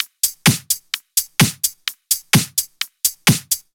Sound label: Loop, 128 BPM